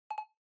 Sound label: Smartphone UI Sound Speaker